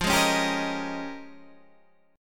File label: Bb Minor 13th F